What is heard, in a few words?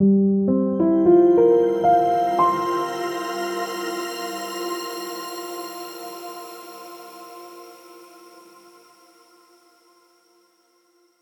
Simple Piano Logo